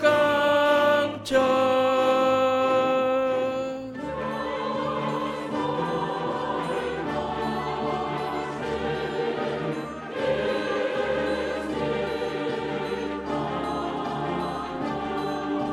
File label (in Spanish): Danza tenores; REPERTORIO ÓPERA; Danza Igor